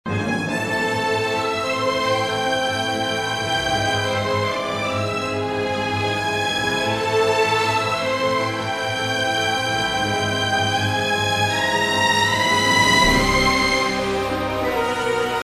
orchestration violins together, with the patches combined; epic orchestral track